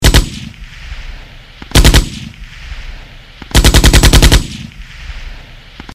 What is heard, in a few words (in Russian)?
avtomat kalashnikova